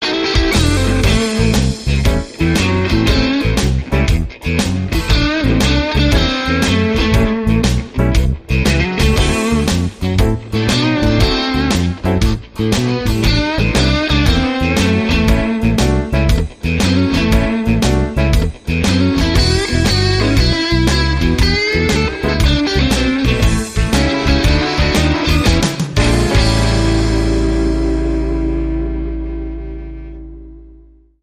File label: Beauregard Blues